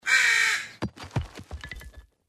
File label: castle raven; snow white